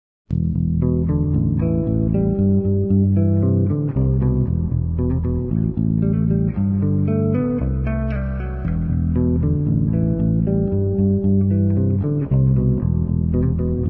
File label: Guitar Electric Bass; Zomboid; Lifestyle